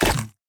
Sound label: dragons enemy lava alfa attack